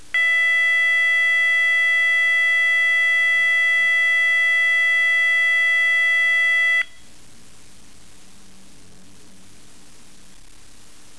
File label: Intermittent 660 Hz at 0.05 Hz (6.5s On-13s Off)